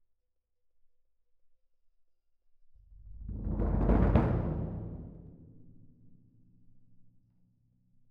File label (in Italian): Cassa Main